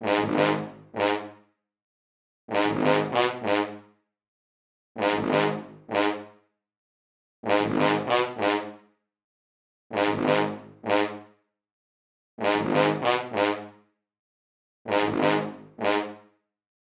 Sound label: Horn G-